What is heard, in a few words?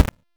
Exalted Sniper Shot; Warframe; Frumentarius